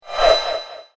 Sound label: Magical Discus; Magic Sound Effects; fantasy sound effect; Andrew Fleming